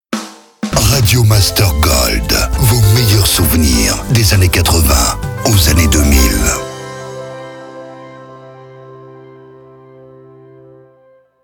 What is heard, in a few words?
Jingle SLOW; Radio Master GOLD